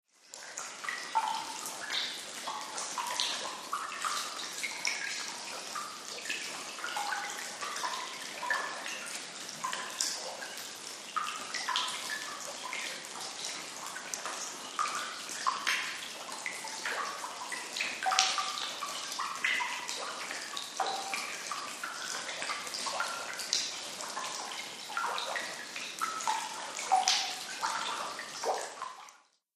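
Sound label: Cave Drip Heavy Dripping, drips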